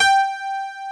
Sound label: ELEC GRAND, JV 1080; Pro-Rec - Synthsonic